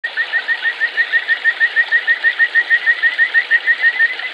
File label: Bufotes luristanicus